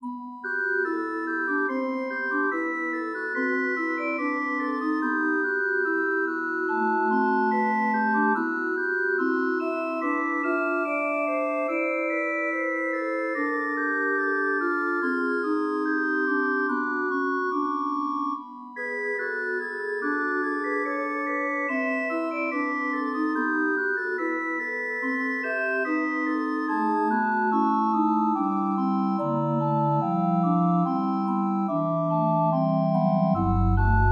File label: Organ; aJHR Organ Book I VII Es wolle Gott